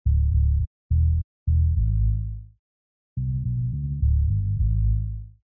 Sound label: bass